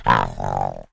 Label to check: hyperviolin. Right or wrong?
Wrong